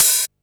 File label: Open Hats, Cymbals